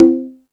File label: Latin Layers, Drum Machines E-MU Pro-Cussion